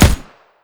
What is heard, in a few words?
smg1 fire; weapons